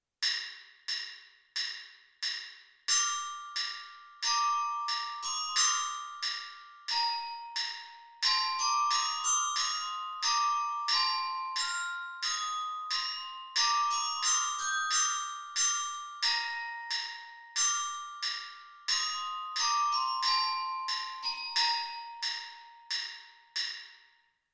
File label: orchestra bells